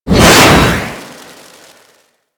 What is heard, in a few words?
Jet Polearm Whoosh, weapons; Grineer